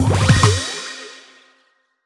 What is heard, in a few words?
X P Added; subway surfers city sound effects; Android, iOS